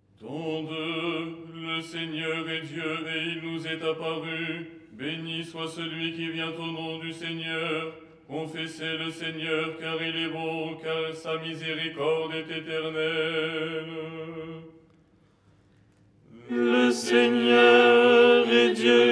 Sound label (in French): tenor